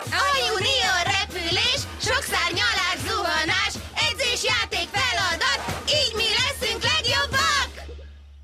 Chant, Hungarian; Junior Speedsters Chant; Season 1

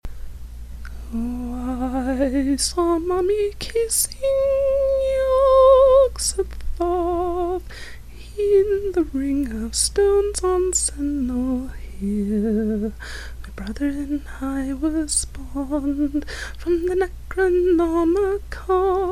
Sings; I saw mommy kissing Yog-Sothoth

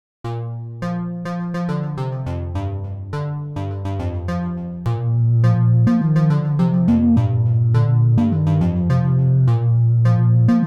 Trance Cover

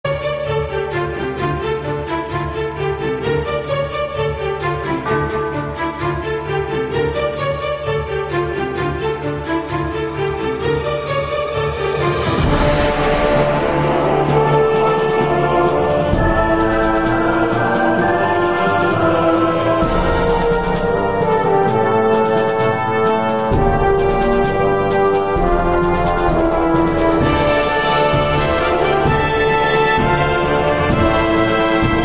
Bagpipe; Bagpipe Brony; Charge!

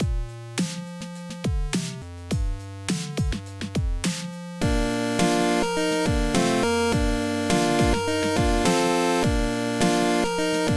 Chiptuney Instrumental

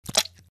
Suck; Lewd SFX

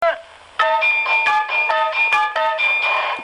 klink klank

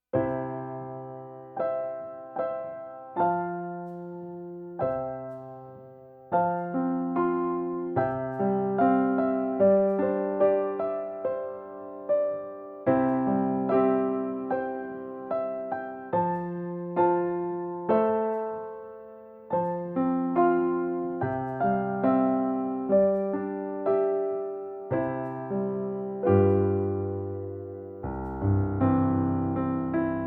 Lullaby Piano Edition; Legend of Everfree

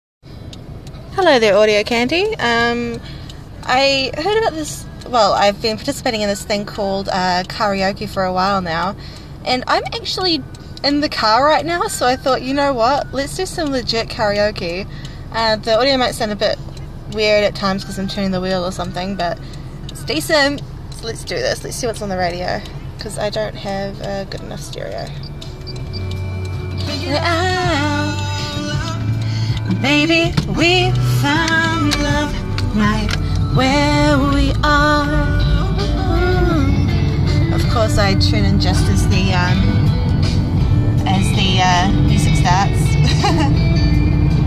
Caraoke, Driving Noise; Literally in a car!

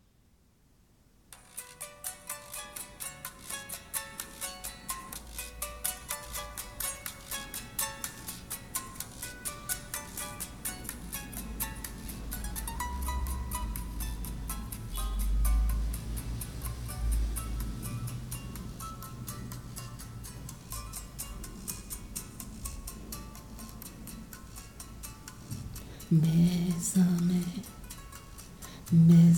Karaoke

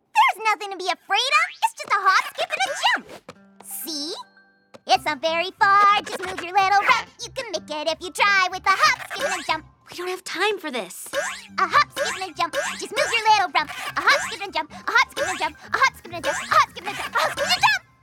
Hop, Skip and Jump (Vocals); My Little Pony OST Repository; Season 1; Bonus Music

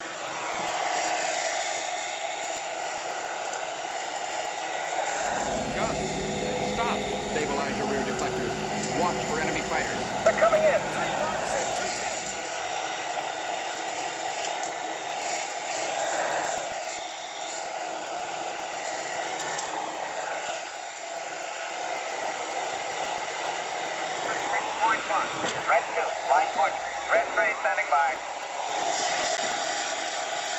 Hairdryer Or TIE fighter

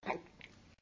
slosh; Lewd SFX

